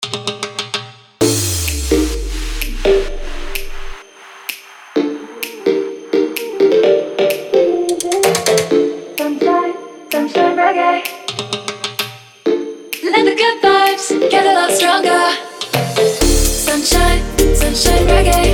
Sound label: Remix